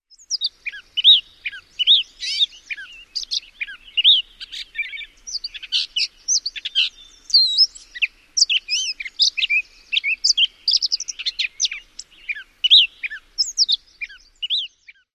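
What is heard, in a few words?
Gray Catbird song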